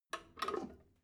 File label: drain valve close regular, badezimmer; haus garten